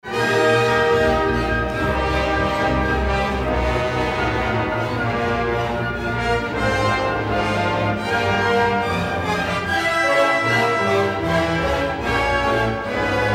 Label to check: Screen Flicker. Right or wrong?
Wrong